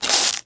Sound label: bone break rip off